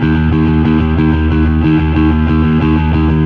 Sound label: Fuzz D sharp 2; torchlight iii; ps4